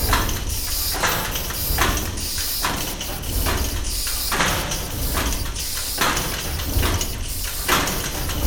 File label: Steam Engine Loop